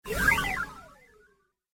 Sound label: Fast Droid Flyby; Robot Sound Effects; Whoosh Sound Effect